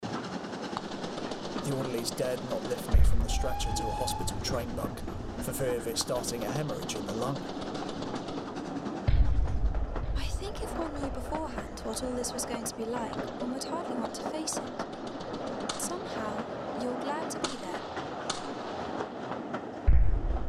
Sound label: Ambulance trains stereo mix